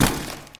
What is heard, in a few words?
CARDBOARD Drop Pieces; don t be afraid the first toy